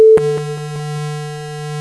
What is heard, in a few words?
scanner; BrainHex 1.0